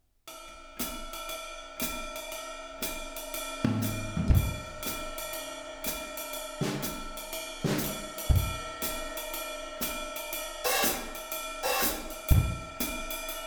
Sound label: 4 Piece 1 Mics, drum set